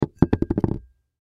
Glass Cup Set Down, Glass Cup Set Down Wood Table Wobble; Dishware; Household; Blastwave